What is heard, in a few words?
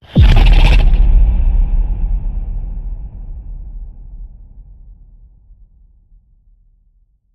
Centaur Snarl Boom